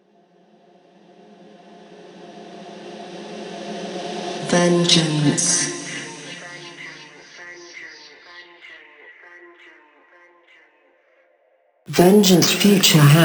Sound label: Intro 130BPM Vocals